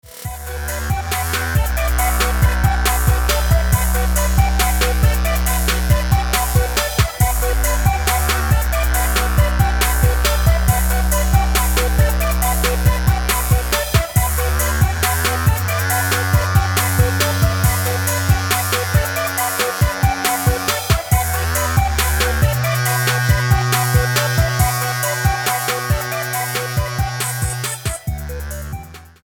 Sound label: Trap Lock Sample